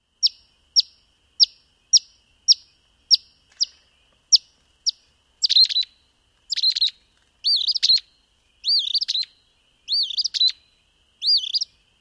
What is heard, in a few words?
flight song